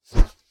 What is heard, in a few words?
Whoosh Rod Pole